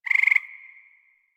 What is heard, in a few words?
Forest Frog Close; Ambience; Warframe; Grineer Forest